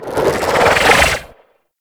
Bow Draw, Weapons; Warframe; Tenno; Ranger; Exalted Bow

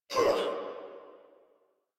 pain crewmen; Warframe; Corpus Crewmen; Crewman B

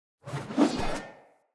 Player Sphere Polearm Whoosh Fill Long; Weapons

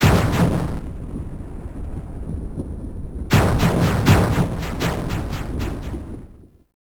Boss Explosion Transition; Warframe; Caliber Chicks; minigame

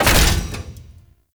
Anti Aircraft Gun Fully Charged; Weapons; Warframe; Archwing

